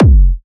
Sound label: Techno, Kick; Techno Sounds 2024